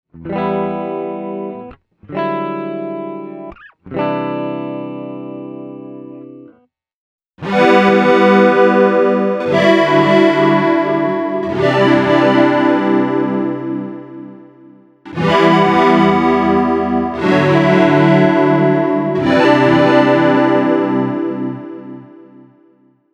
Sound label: Poly Transform; Meris Enzo